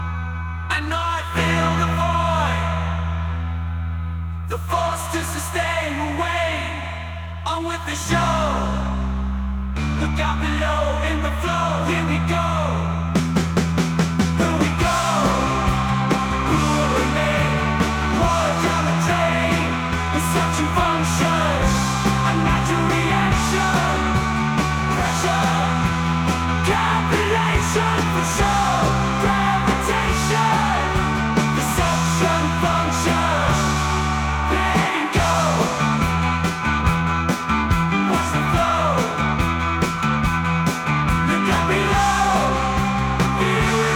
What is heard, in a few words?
Suction (Water Down the Drain)